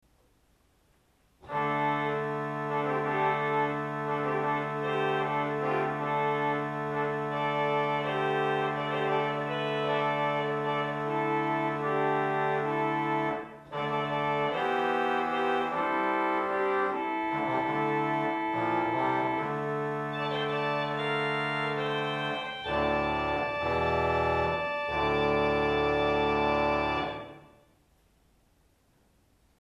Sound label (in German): Tromp 8, Orgelstimmen